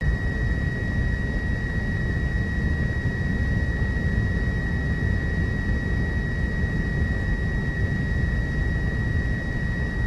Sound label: room; firefight